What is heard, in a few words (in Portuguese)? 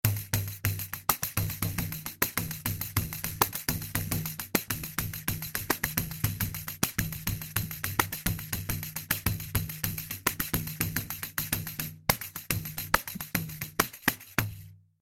pandeiro